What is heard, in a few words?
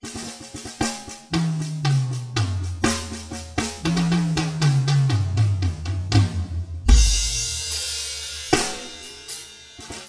Drum Solo